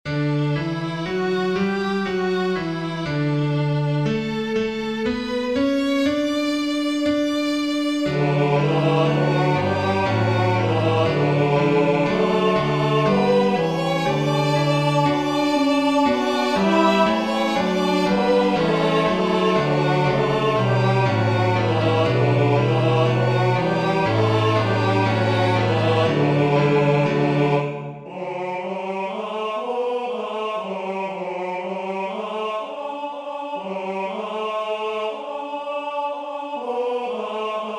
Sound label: tenor; unto us a son is born; willcocks